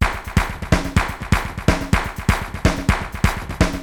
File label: 80s delay Akai XE8 (125BPM), cyborg beats